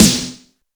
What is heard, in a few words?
crisp snare drum sound E key, snare drum sound tuned to the E note; snare drum samples